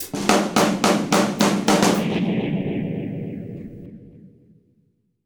Fills, Monster Beats; Zero-G

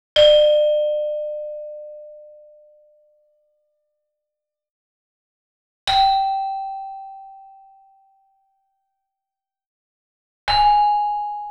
Gamelan; Propeller Island - Complete Gamelan

